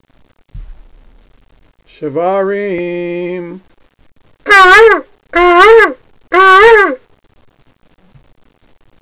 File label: shevarim